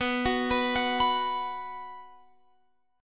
B 5th B, chord; B5 Chord {19 21 21 X 19 19} arpeggiated

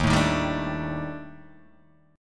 D sharp Major 7th Flat 5th, down, Guitar, Open D

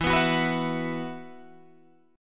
E Suspended 2nd, Guitar Open G; 14,16,16,16,17,16

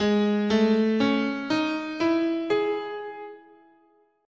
Ab Japanese, scale

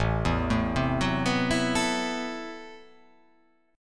A Minor 6th Add 9th A, Chord; Am6add9 arpeggiated; 5,7,7,5,6,6,6,6; JGuitar

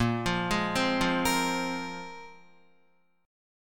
Bb 7th Suspended 2nd Bb down Guitar; Bb7sus2 arpeggiated

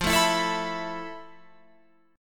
F Major 7th Flat 5th Guitar Open F, chord; FM7b5; 17,16,16,16,19,18; strummed

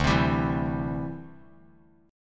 D 9th Suspended 4th D down Bass Standard; strummed; JGuitar

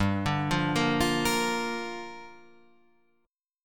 G Minor 7th; Gm7 arpeggiated; JGuitar

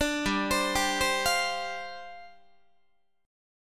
G 7th Suspended 4th G down Guitar

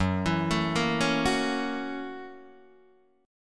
F sharp 7th Sharp 9th, Guitar, Open D

